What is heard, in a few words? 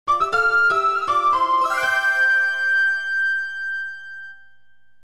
wii sports intro music